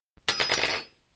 Fallender Loeffel

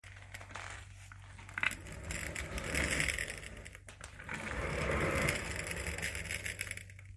Rollen eines Schreibtischstuhls

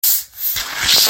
Aufdrehen einer Flasche

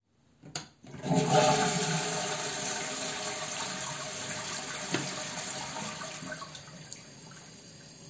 Klospuelung

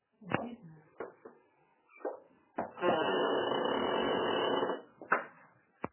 Tuer

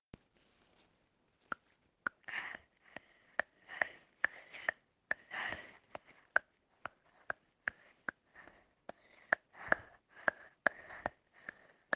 Zaehneklappern

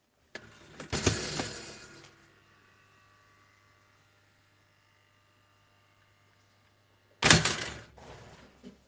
Toaster

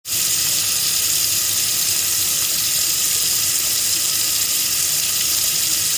Wasserleitung

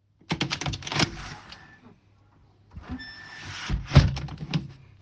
Dachfenster

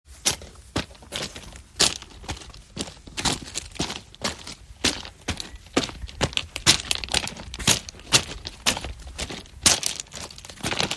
Ueber Steine laufen

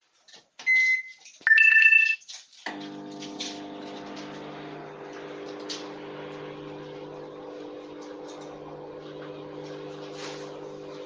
Mikrowelle

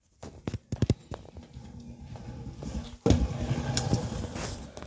Sprung mit dem Roller

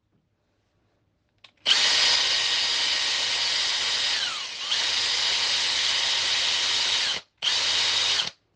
Akkuschrauber

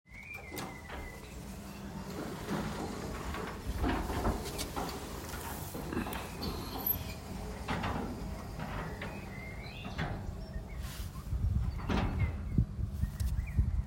Garagentor; Garagentor schließt

